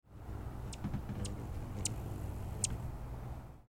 RUHU vocalizations

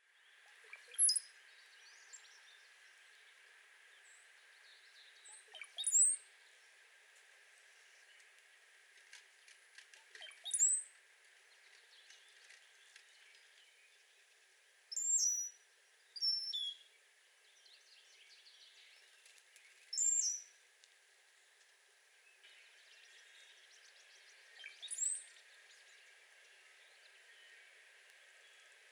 Cowbird Displays

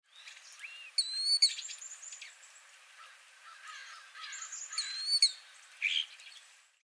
European Starling imitates Killdeer

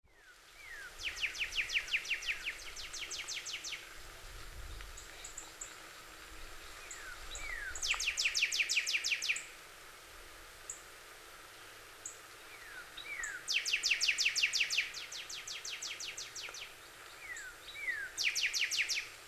Cardinals

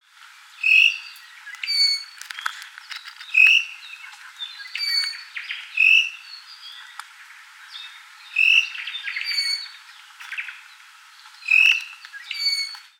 Iiwi singing

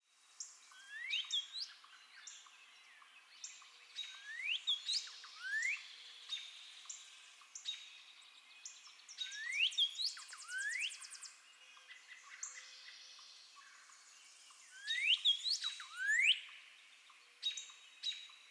Northern Cardinal 10 songs

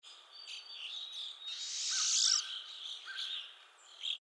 Pine Siskin Zip

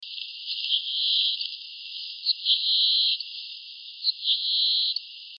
Le Contes 3 quick songs

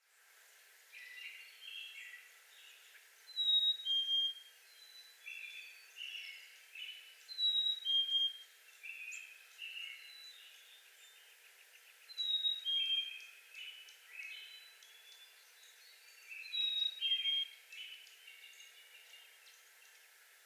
Black-capped Chickadee Songs

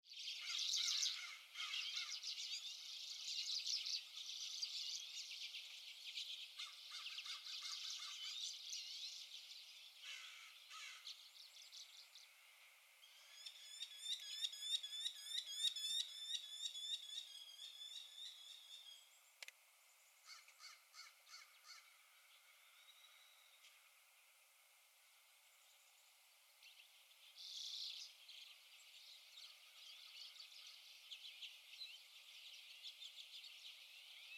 Common Redpolls and Merlin

